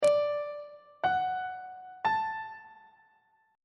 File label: g flat locrian chord 6 is e double flat major on treble clef